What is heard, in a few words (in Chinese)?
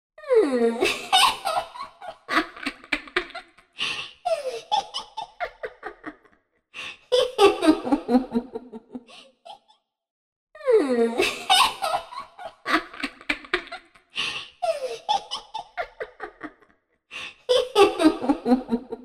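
国语 女声 巫婆阴森笑声; 游戏-巫婆阴森笑声02 素人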